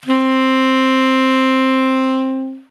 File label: saxophone tenor C octave 1